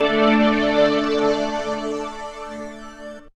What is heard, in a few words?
Polysix 4th Vinyl Synths D3; vinyl chords